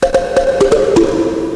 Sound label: do do do